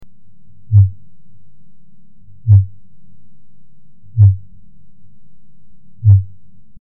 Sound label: fin whale